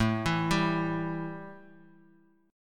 A 5th 7th A, guitar chord; A57 Chord {5 7 5 X X X} arpeggiated

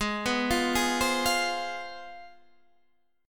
C Major 7th Suspended 4th Sharp 5th, Ab, chord

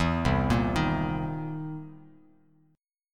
C 7th Sharp 9th C Bass Standard; arpeggiated; JGuitar

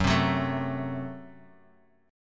F sharp Diminished, Guitar Open G; 4,5,4,2,x,x

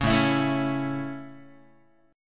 A Major, Guitar; strummed

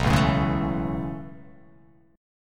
B 7th Suspended 2nd Suspended 4th down, chord

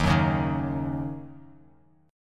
D sharp Minor 7th

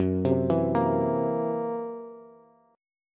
Fsharp 7th Flat 5th down Bass

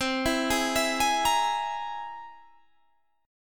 C Augmented 7th, Guitar, Standard; arpeggiated; JGuitar

